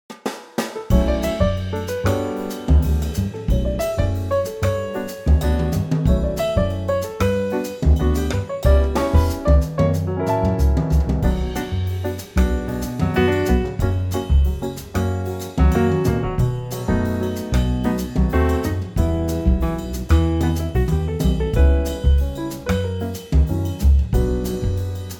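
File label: BOSSA NOVA Bm, backing track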